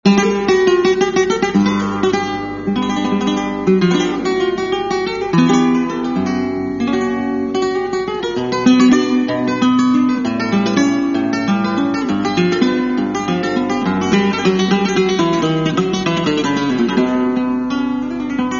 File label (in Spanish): solo de guitarra